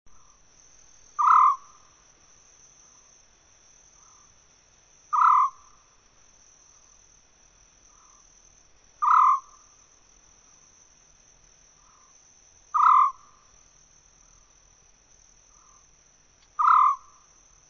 African Scops-Owl

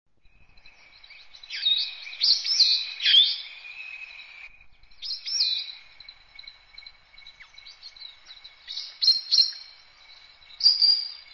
Meyers Parrot

